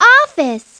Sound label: office; Treasure Galaxy